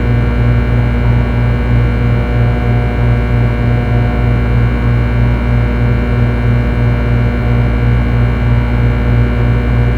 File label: industrial hum; Call of Duty Modern Warfare